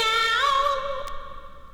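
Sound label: voice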